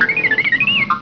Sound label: artoo